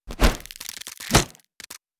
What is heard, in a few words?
Attack Charge; Mars Sortie